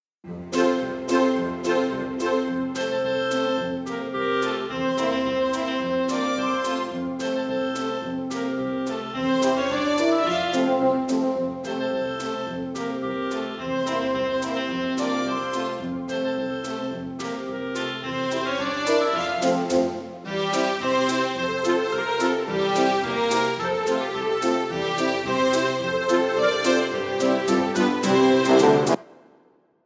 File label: accompaniment